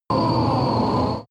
extrinsic cry, energie

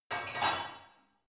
barbell only replace reverberant

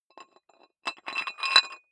dumbbell thread put on weight soft, fitnessstudio

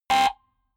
button, signale; soundpool

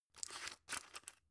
drug put in; berufe; arzt

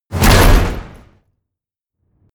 wham, whooshes

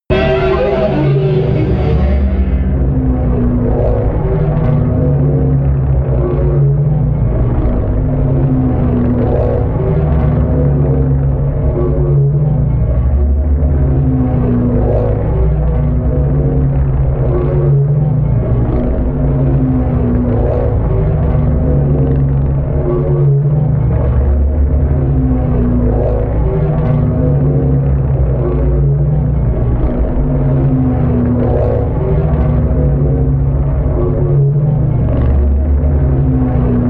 drone erratic growls

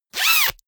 zipper pullover jacket close fast

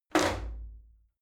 shower slide door open hit regular

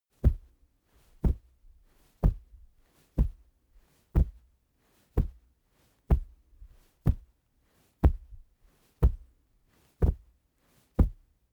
monster footsteps sand; schritte

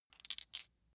metal chain small handling

